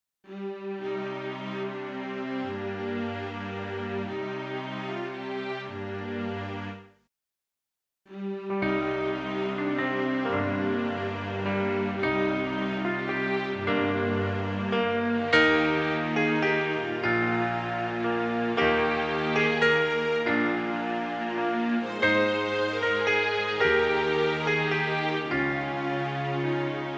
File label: Yamaha SPX90 Hall Orchestra